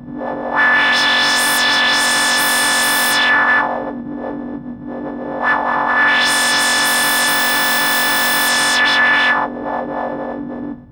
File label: Modular, sci-fi